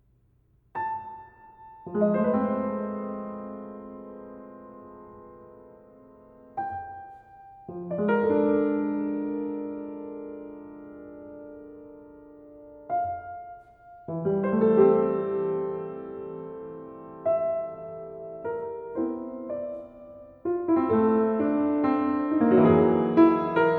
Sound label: three preludes excerpt